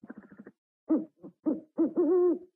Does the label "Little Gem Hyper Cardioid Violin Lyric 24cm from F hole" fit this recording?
No